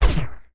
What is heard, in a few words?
magic cast arcane; MagicArsenal